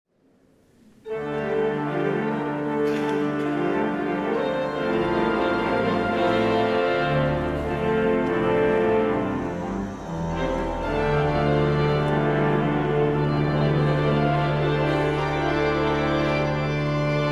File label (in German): Halleluja Ruf; Patrozinium 2015